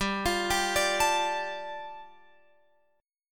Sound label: G 7th Suspended 2nd G, Guitar Open F; arpeggiated; x,14,17,14,17,16